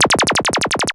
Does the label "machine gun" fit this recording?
Yes